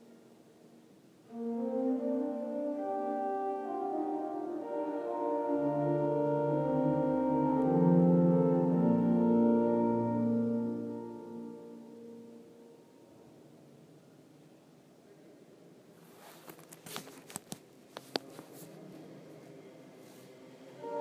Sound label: hautbois organ in martinikerk groningen